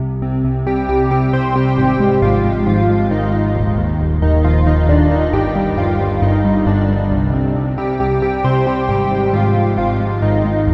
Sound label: Key; Routed Sound; Sylenth1 Expansion - Reality Maker Vol.1